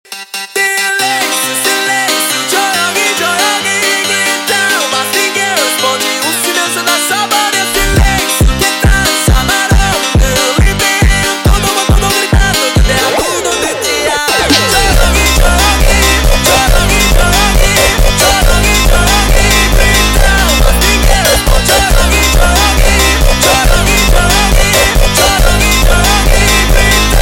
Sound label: Funk Sped Up, funk